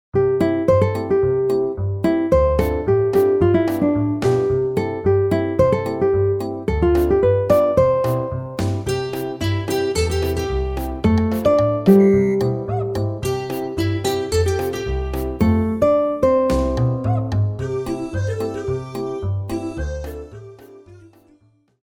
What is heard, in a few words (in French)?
carnaval samba